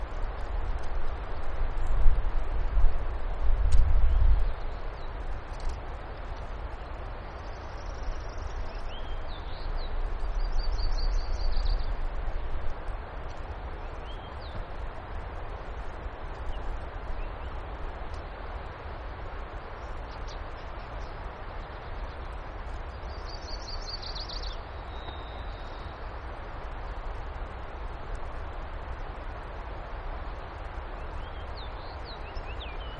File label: Animal Movement, Bird Chorus, and River; alaska acoustic highlights